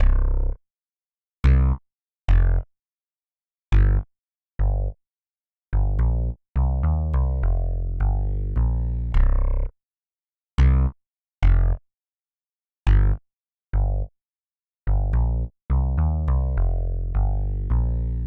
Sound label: March of the Bells